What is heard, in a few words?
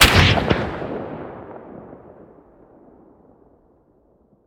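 weapon sniper sup fire player atmo ext; gmod; viper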